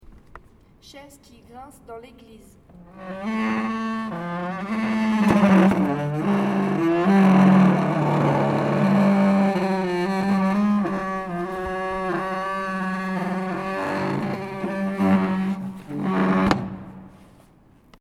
chaise qui grince dans l eglise

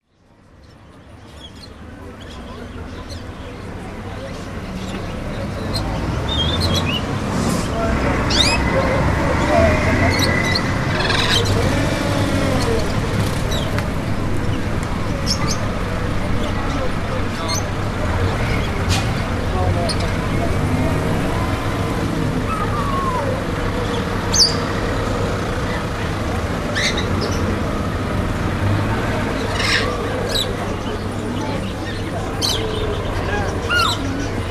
oiseaux exotiques

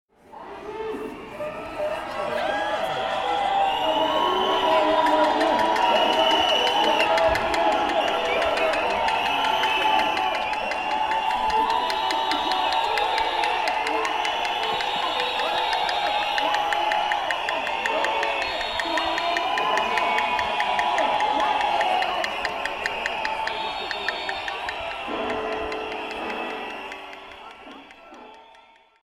Manif sifflets

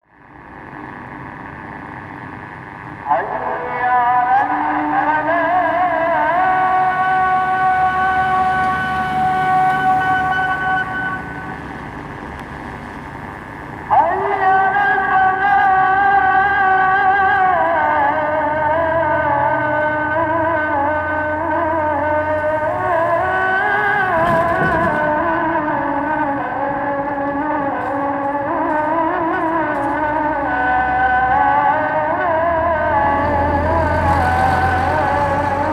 mosquee sortie de kerman

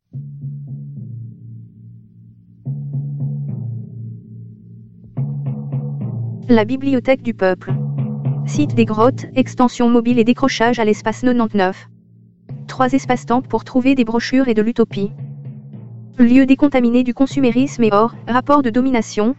jingles

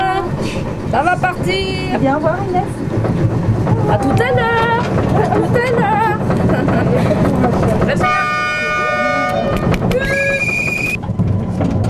Train jaune; MZ-N710; Messeix

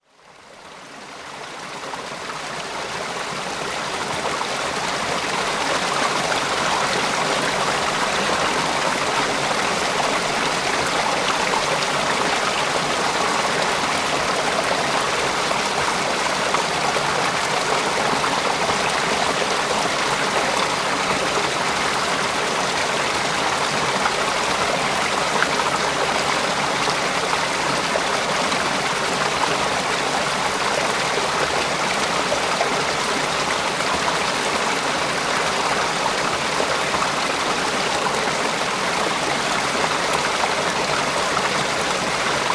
Fontaine de Vareilles

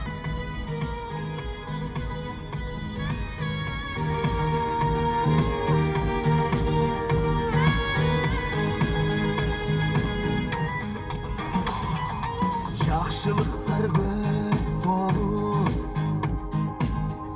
Musique dans la voiture by night

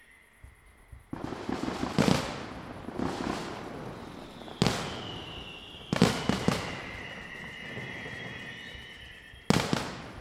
feux artifice

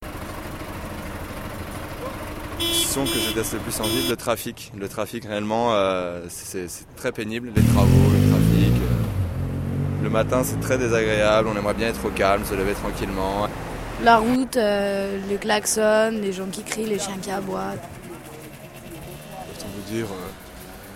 bruit ville

